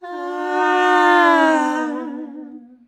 AAAH PITCH, MALES; Best Service; Hyper Dance

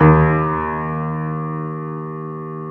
D#1, GRAND PIANO; InVision Interactive; Lightware VOL-1; Instruments & Percussions

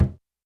Surdo Mute, YAMAHA RM1X

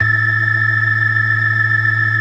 FAST VIB, TREMELO+VIB, Synth and Organ

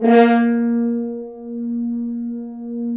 BRS, FHns Accent; Roland L-CDX-03 Disk 2

